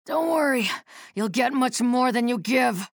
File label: pain ability damage; nyx; low health